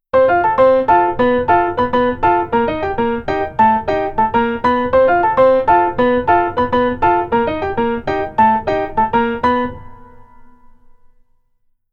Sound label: Keyboard Rhythm Pf Only